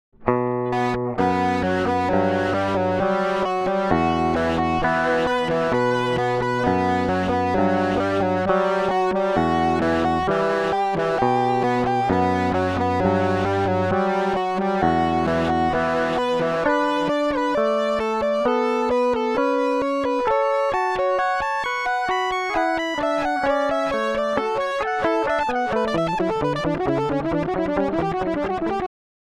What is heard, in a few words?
ice delay